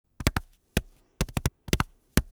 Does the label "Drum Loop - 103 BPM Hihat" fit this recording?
No